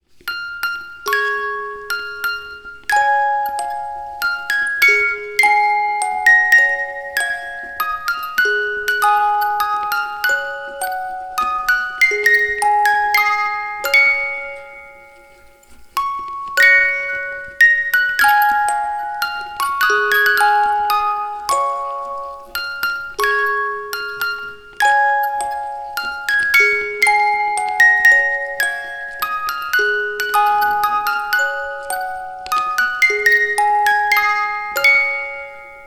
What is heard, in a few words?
Lullaby Music Box Brahms; chillout; childrens-music